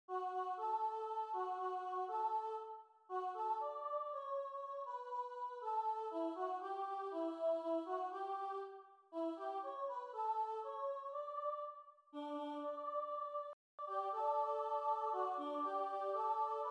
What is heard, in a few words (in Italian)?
Ninna Nanna Brahms Soprano; Ninna Nanna di Bramhs